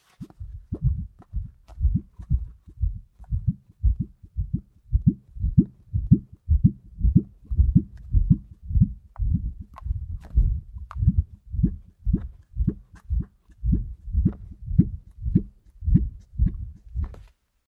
buyon

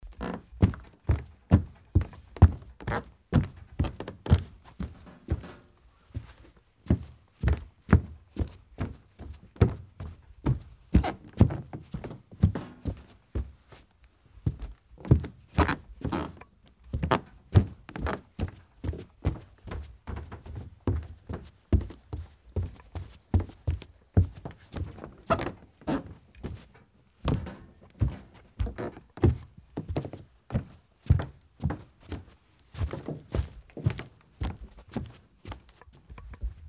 wood corridor

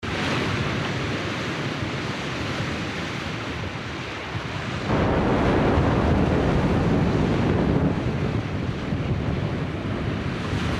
japan storm

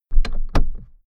door close

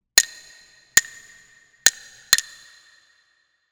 kobyoushi

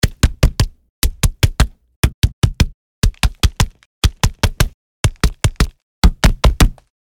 punch

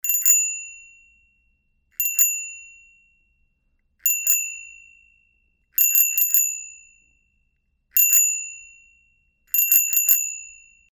bicycle bell

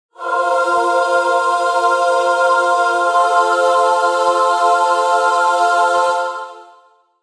jingle chorus

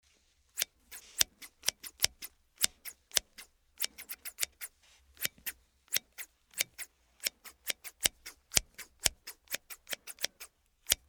scissors

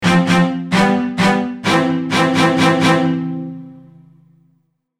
logo orch

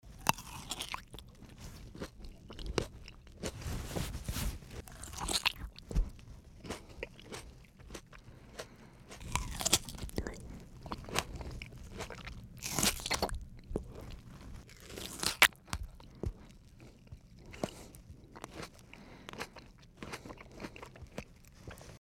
tomato

